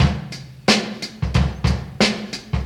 90 bpm drum loop sample d key, Free breakbeat sample - kick tuned to the D note